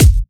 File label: Bassdrum